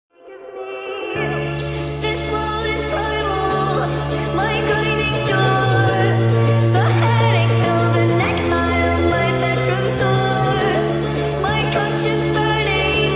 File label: speed up instrumental ringtone